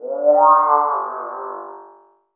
VOX, Drum & Bass; Ueberschall; Drum & Bass Resonance; 150 BPM

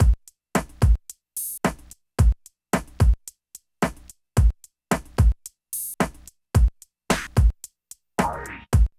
DRM LP; East West - Phat & Phunky CD2; 90's Sample CDs

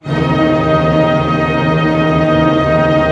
TREM D# FF, ORCH TREM; Sound On Sound - Peter Siedleczek's Orchestra

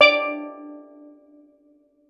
STEEL DR, Steel Drum Lead Pan; E-MU Producer Series Vol. 7 – Old World Instruments

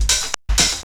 PERC, PERC LOOPS

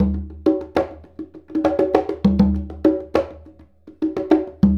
Congas; Essential Percussion

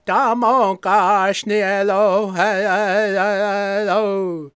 C# MIN, World Voices, LAKOTA

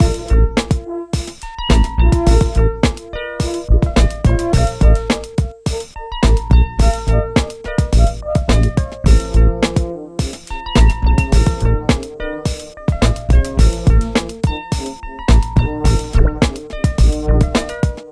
Monkey Funk, Urban Grooves; Sonic Foundry (Sony Creative Software); Stylus Pressure Urban Grooves on Digital Wax

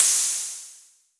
HHo Blofeld; Waldorf

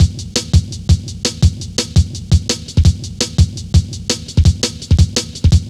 Steppy, Jungle, Drum Loops 168.5; Zero-G Jungle Warfare 1, 2 & 3

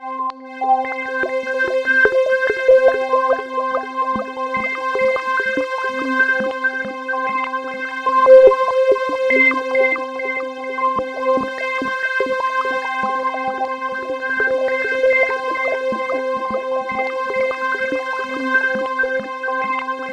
C4, SynthLine; 90 s Sample CDs; NiceBeats; SynthLine VOL-10

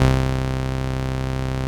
505, String Machines

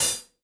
Gretsch Hi-Hat Closed; Sonic Foundry (Sony Creative Software); Vital Drums

